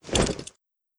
Human Hunter Move

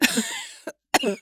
Coughing

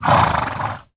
Animal Horse Snort Dry Mono; Legend of Keepers

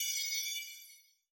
shard Break

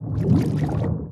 Jellyfish Move; hollow knight gamerip

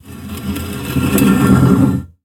Stone Sliding; frogsong

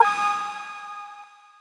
Music; Music Frontend

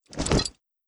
Human Hunter Move

